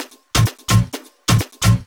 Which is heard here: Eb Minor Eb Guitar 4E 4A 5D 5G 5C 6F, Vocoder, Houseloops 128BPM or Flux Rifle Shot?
Houseloops 128BPM